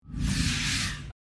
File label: hologrid online